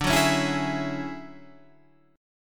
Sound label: D-Major Sharp 11th, D